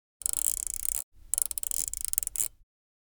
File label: Radio volume control knob handling